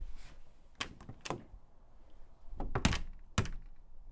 Door Open Shut

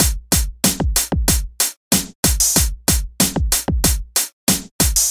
Drum

